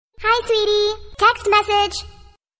Sms Ringtone

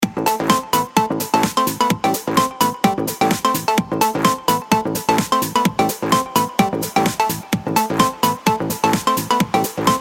Sweet Ring

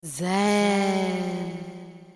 Ghost Fem Voice

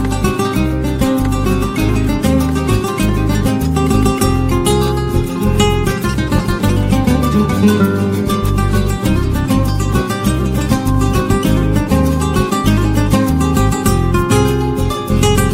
Spanish guitar

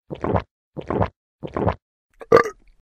Drinking

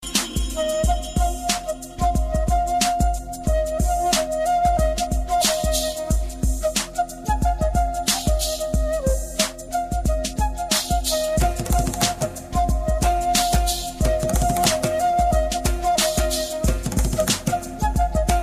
Melody Ever Ringtone

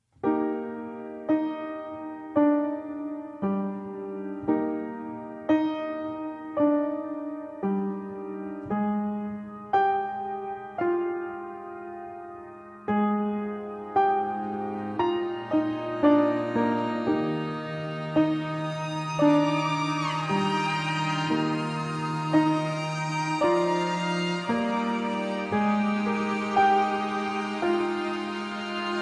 Instrum

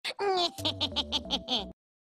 laughing; Peridot

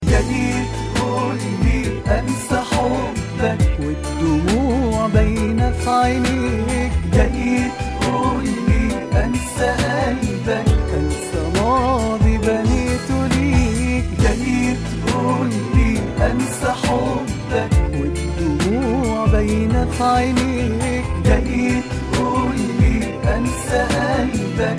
Arabic

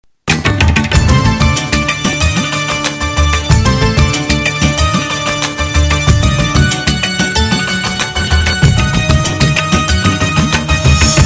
Clasical

